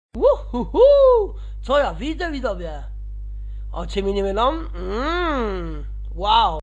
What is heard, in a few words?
uhuhuhu